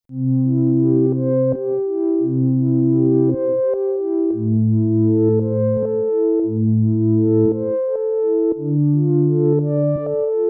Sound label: minimal